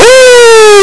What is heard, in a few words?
death; captain silver